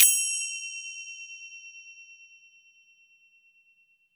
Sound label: FINGER CYM